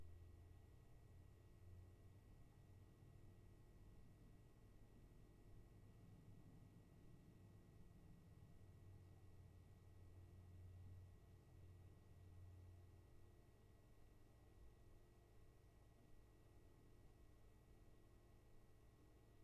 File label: Room tone